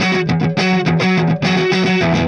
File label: Clinical Distorted Funk Guitar